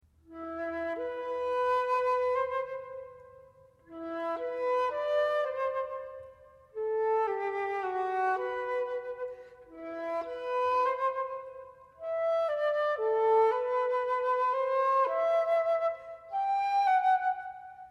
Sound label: solo for flute